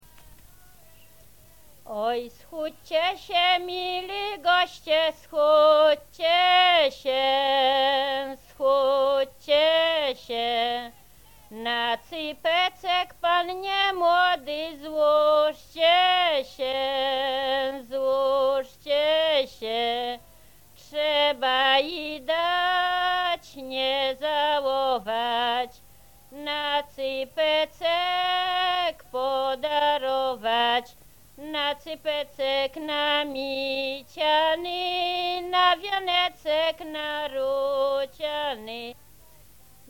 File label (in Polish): Przypisowka, Oj schodzcie sie mili goscie